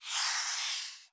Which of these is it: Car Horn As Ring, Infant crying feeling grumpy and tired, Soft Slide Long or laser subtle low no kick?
Soft Slide Long